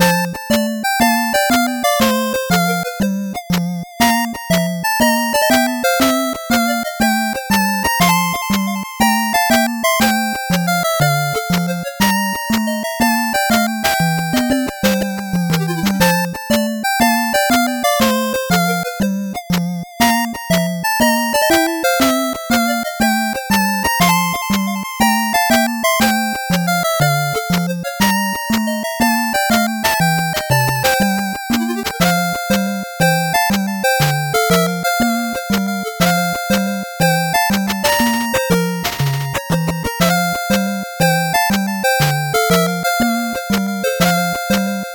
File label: 8bit bgm slow